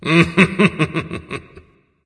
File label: laugh; smite; hachiman